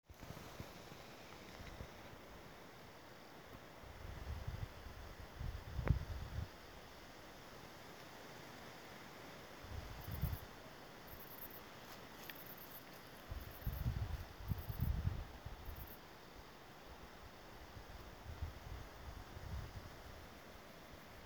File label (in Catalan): vent